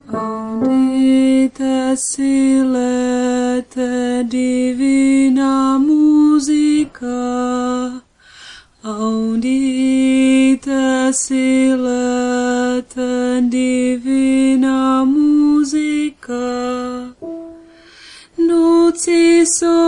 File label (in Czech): audite tenor